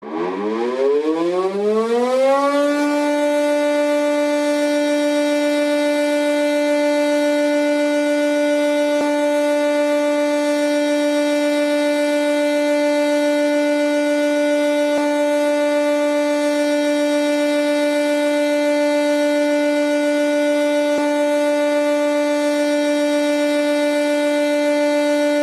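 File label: shobo sairen, fire siren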